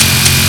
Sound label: empty, weapons; cerberus; tf2classic